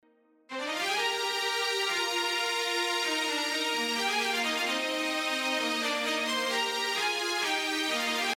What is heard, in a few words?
Strings; HALion